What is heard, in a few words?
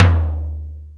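Lo Clear, Alesis D4 drum machine tom; 300 Drum Machines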